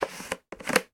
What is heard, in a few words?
household tub plastic lid off; sound effects one